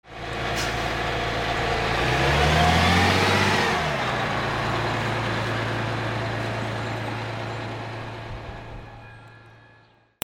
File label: VEHICLES